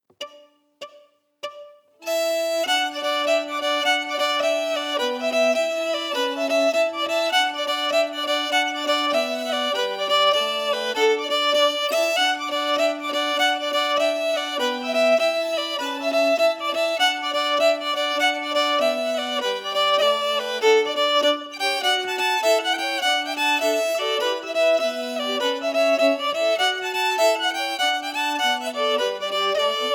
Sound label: melody emphasized